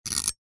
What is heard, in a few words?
household comb metal teeth scrape hard single with sharp metal; zapsplat